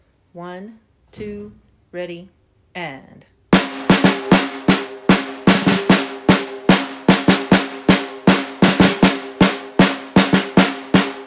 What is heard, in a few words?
percussion